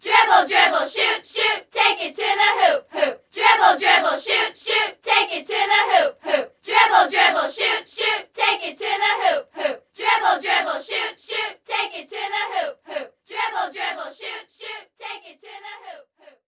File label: Sfx Basketball